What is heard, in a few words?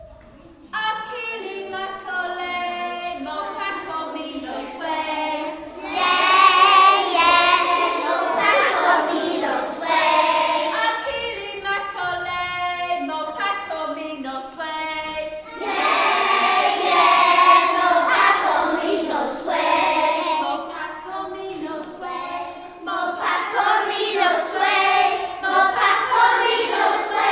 Y2 singing